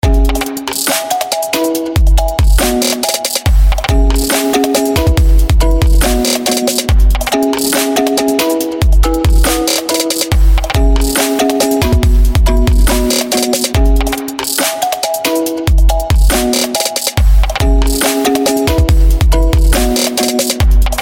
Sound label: chill